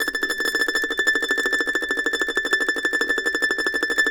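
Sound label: mandolin tremolo A6; Sampleheads; Whole Lotta Country 1 & 2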